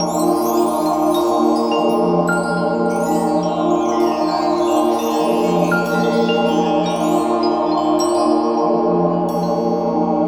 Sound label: Crystal Loop Glowing